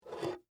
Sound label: Whiskey Bottle Up, Pick; Foley; Drink Mixing